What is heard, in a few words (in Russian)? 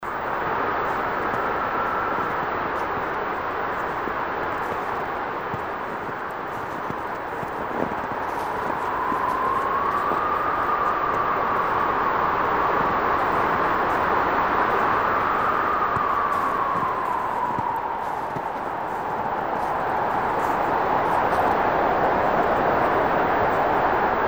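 звук шагов и сильного ветра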